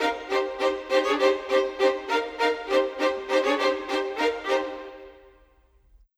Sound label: Violins, Orchestral; Sonic Foundry (Sony Creative Software); Orchestral VOL-2; Modern 06